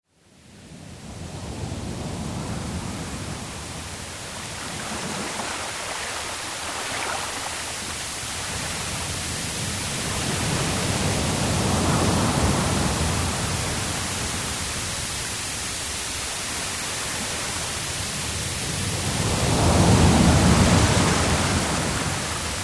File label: ocean surf white noise; sound oasis